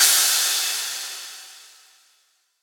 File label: Riddim Crash; Hats & Cymbals